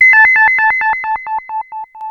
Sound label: ARP 2600 C4, synthesizer; Hollywood Edge - Giorgio Moroder Rare Synthesizer Collection